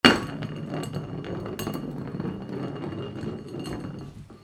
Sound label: FALLING